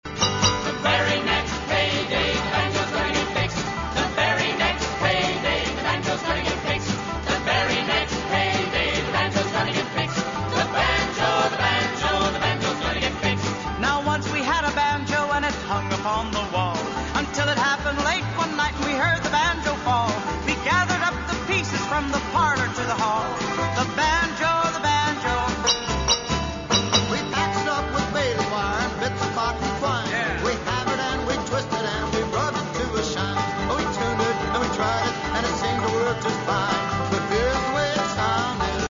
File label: The Banjo